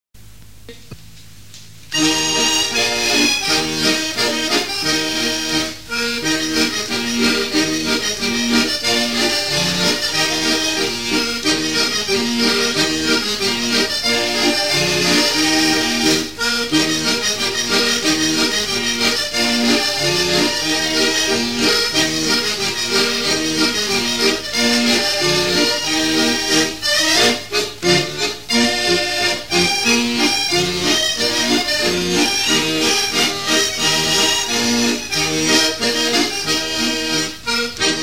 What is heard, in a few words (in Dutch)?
Turfstekkers polka